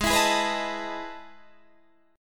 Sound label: E Major 11th chord